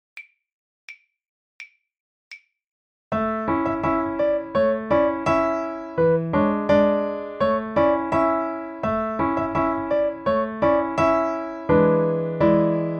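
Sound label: Prep for London Bridge accomp, violin; The Violin Fun Book (Level 2)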